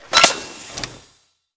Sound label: napalm cannon in, flamethrower; kf2; weapons; trapper